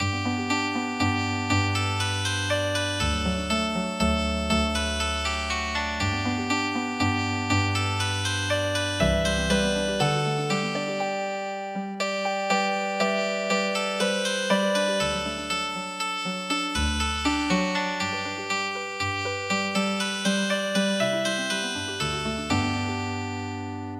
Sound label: Healing Harp, midi traditional; Waiting For Spring